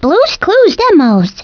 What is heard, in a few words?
BLUES; Humongous Entertainment; Freddi Fish 5 the case of the creature of coral cave